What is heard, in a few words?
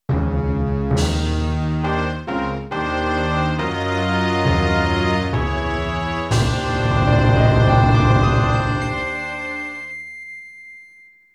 Boss Clear; Legend of Zelda, The : Ocarina of Time